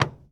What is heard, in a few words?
Plane P40 Step On Wing; Airplanes